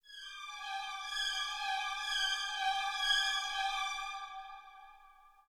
Scary; The Black Mirror

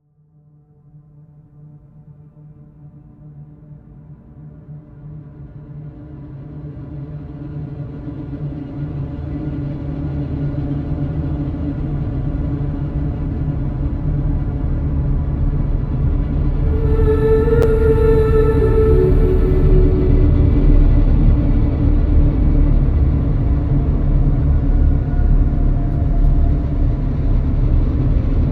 tesla long low rumble

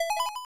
Select Jingle; pro mahjong kiwame 2; snes

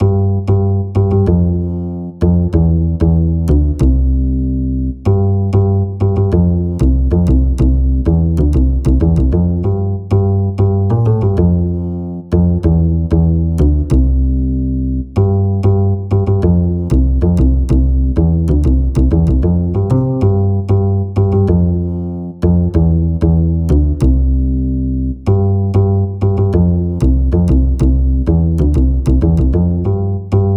Bass

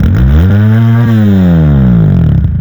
Car Rev

